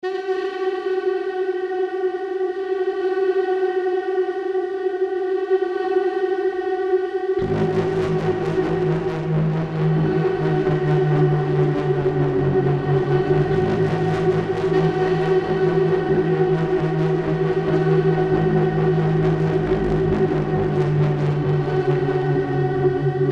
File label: tenor sax extended techniques; arborism; complete extended techniques collection; elastic sounds